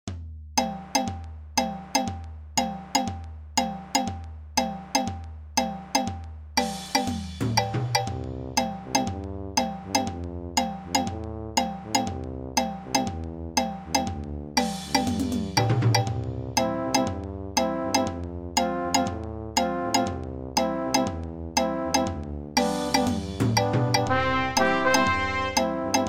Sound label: Reggae easy; midi